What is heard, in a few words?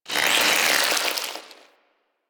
plant murmur; Ambience; Props; Warframe; Infested Eris